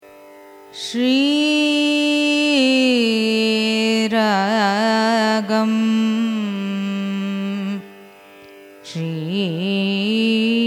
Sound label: raga shri ragam signature, carnatic music